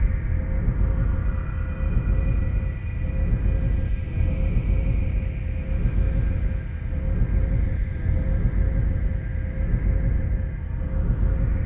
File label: Portal; KardaMahal Underground Temple